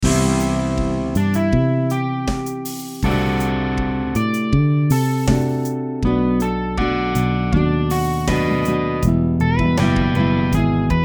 B melo Guitar

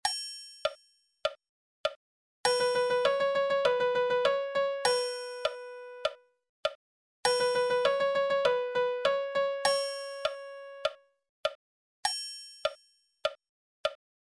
music

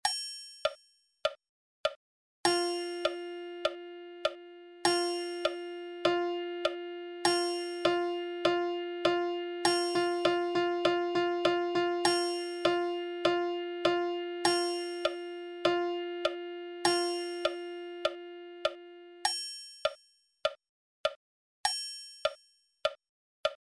music 4 type Notes

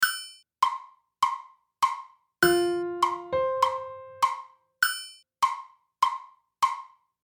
piano

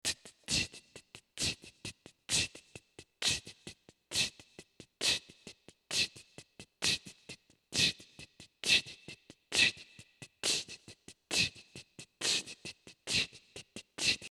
phrase loop

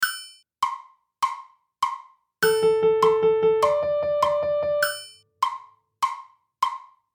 sextuplet

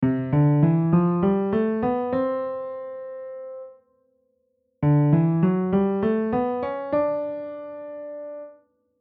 scale major piano

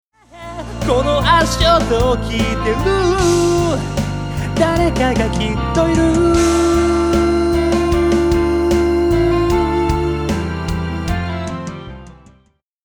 beats

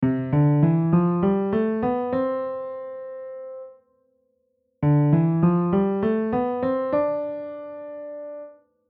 scale piano

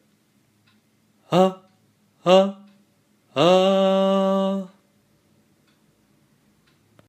low

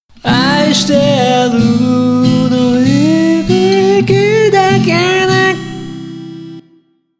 sing many tone

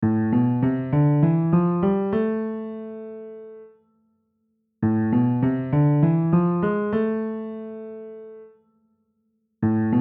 scale minor piano

